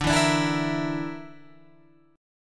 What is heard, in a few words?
D Suspended 2nd Sharp 5th D, Guitar, Open F; 14,11,14,11,13,11; strummed